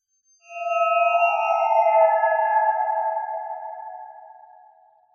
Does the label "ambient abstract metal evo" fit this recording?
Yes